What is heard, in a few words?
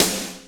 plate, snares real